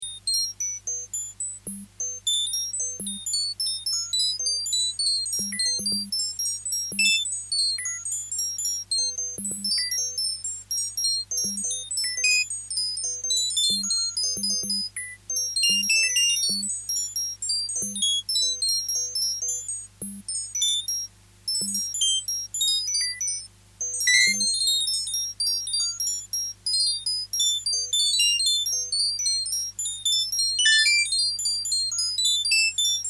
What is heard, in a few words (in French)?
Cloches aurore